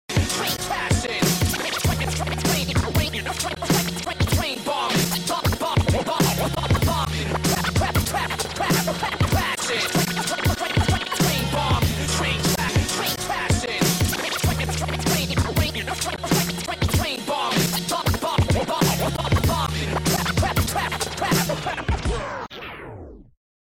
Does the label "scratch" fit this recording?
Yes